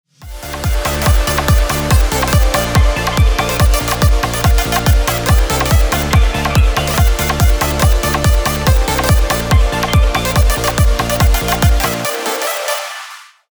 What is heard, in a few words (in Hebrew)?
אחרי eq; טיפים מהסטודיו 3 אקולייזר eq ומיקס בסיסי